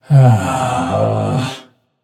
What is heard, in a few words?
nv sigh; smite; xbalanque